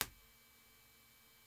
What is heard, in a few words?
left only center mic